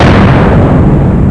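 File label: deep explosion; HIGHTECH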